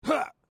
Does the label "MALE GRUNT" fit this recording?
Yes